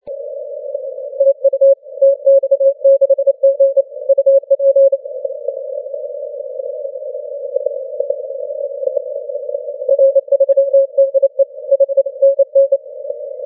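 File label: 15m continuous wave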